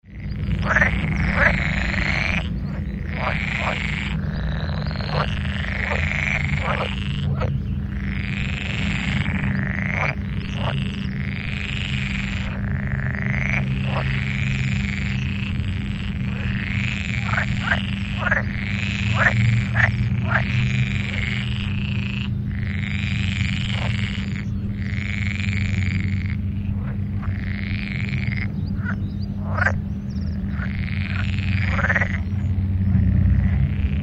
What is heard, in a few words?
Pelophylax lessonae